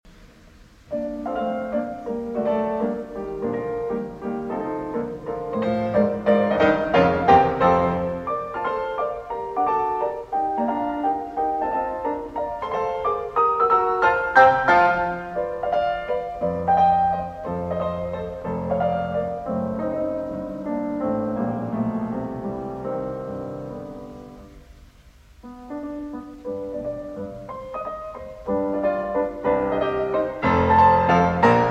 Piano Sonata No. 29 in B-Flat Major, Scherzo. Assai vivace; Op. 106; Hammerklavier